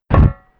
walk metal; gamerip; shattered horizon 2009

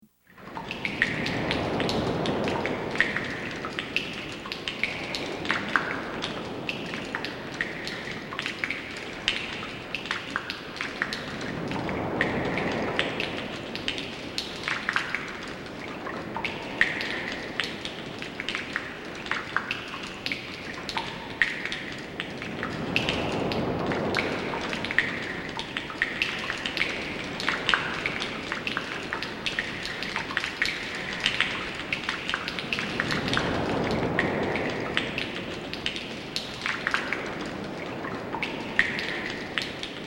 Cave Ambience; Cape Claw Cave

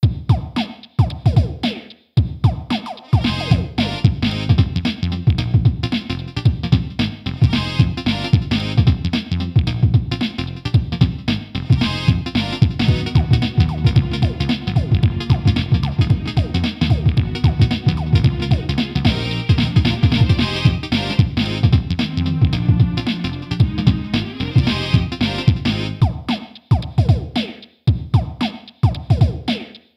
Café - Rap (Instrumental)

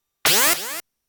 Teleport; Zero Teleport; Alien Soldier

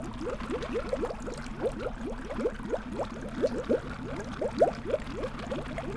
ambient underwater engine; SpongeBob Diner Dash ios gamerip

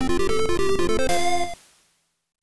Round Start, Round Clear; Logical; PC-98

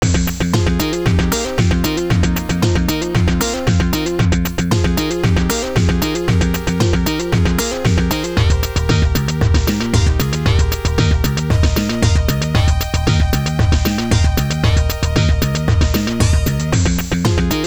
Back Beat; viper v6 turbo x68000

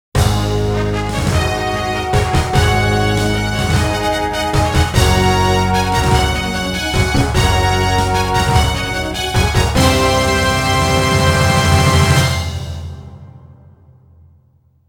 Grand Prize Fanfare; Tanjou S Saturn version original soundtrack perfect collection

